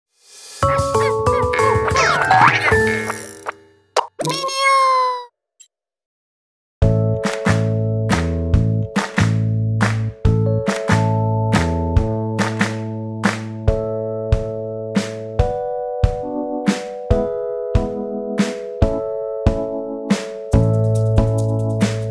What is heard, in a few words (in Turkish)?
sarkisi sozsuz karaoke; 23 nisan